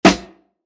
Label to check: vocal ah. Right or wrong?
Wrong